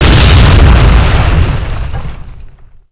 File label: explosion; weapons